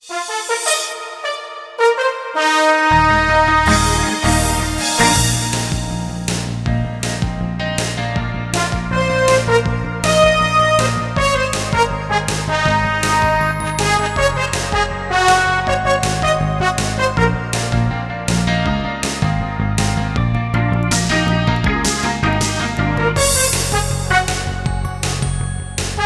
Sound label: victory major